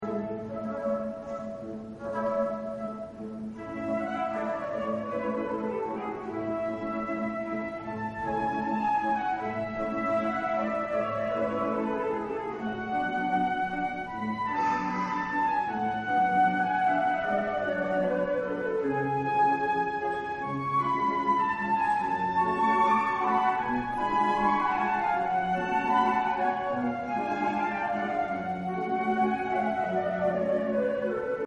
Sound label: concert, flute